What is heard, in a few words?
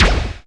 Strange Kicks, Percussion; Dance Synths